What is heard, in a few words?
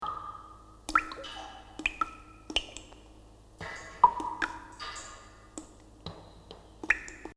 cave drips; thewell